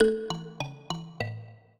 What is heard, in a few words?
minuet, mbira; Mozart